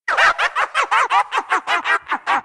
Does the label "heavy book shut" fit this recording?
No